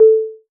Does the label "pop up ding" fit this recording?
No